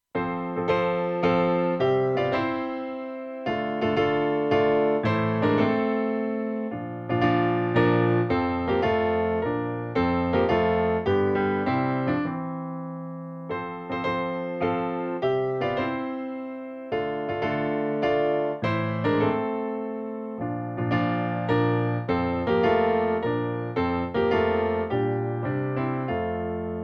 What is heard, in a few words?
Mazurka Chopin Op 68 No 3